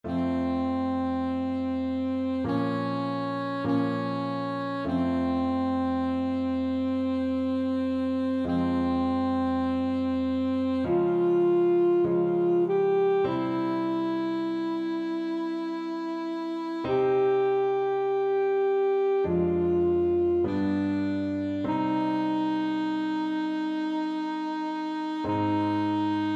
saxophone; purcell queen

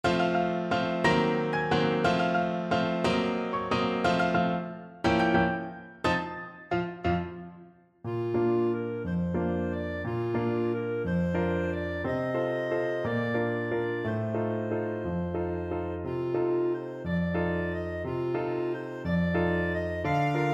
waltz, clarinet; merry widow

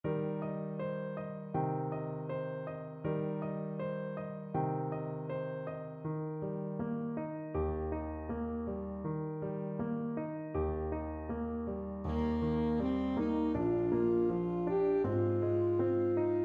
takeda, saxophone

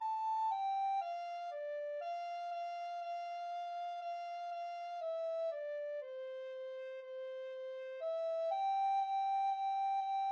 recorder

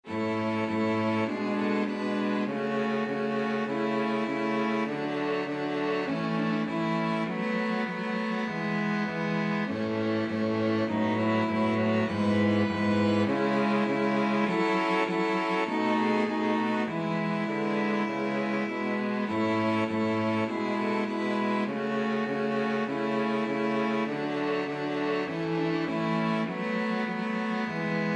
land of hope, string quartet; elgar

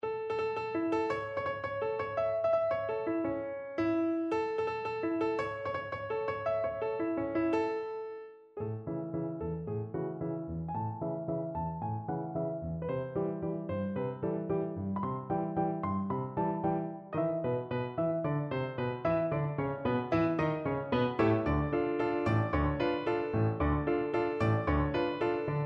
chorus of children piano; carmen

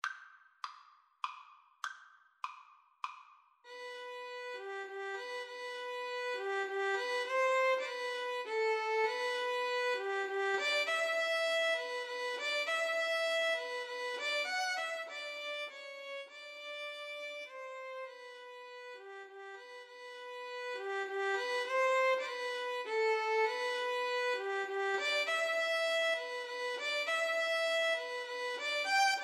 violin duet

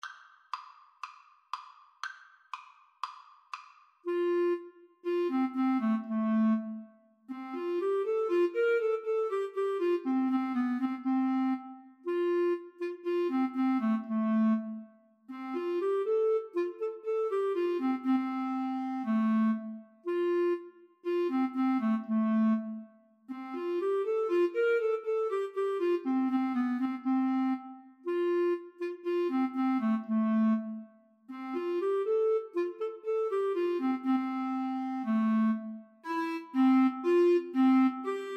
Clarinet Oboe Duet

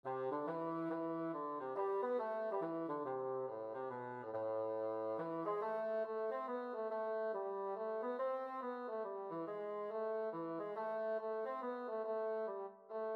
forget not the angels, bassoon

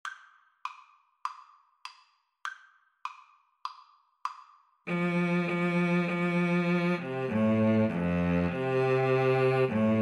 Hark the Herald Angels Sing for Violin-Cello Duet

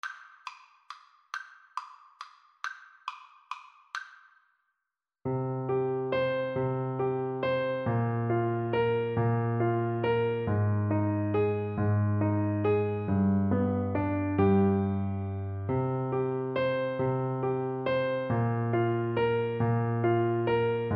greensleeves, Baritone Saxophone

